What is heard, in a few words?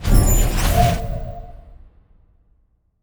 door open, doors magic; dragonroll